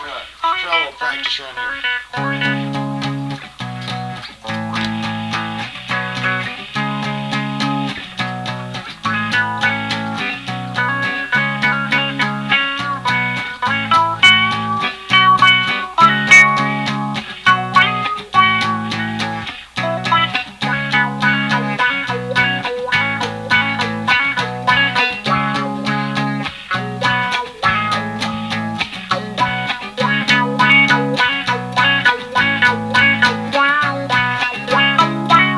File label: groovy, jamming out